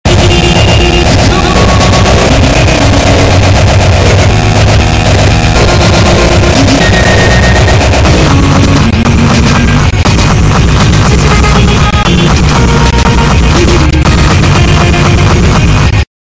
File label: sweet 90s breaks future beat remix, lolicore